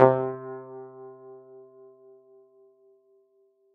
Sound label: far string